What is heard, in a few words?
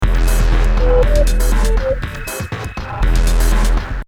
On The Move 120 BPM; cinematic drama